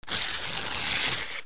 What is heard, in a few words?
RIPPING